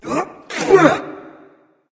nv clear throat; smite; voicepack; mercury

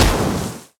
hit fire; smite; god merlin

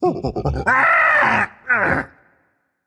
ouch; smite; hunbatz

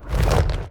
wing small; smite; god cthulhu

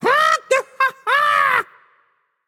laugh, voicepack; smite; jorm

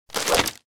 umbrella close; smite; vamana

